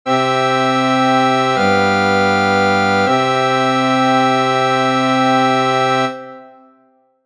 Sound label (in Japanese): 属7が入ったカデンツ